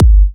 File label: Kick; WAProd Free Kick Samples 2018; WA Kick Pack 3